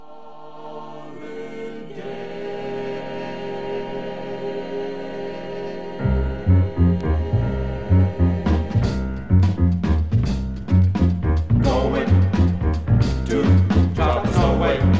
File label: vocal jazz